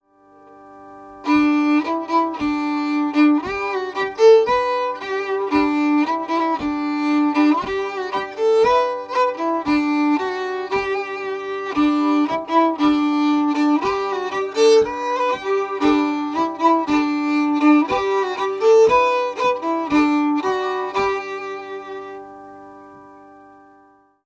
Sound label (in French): mode franc, tambour